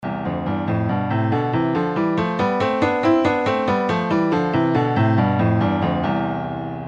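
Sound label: In a third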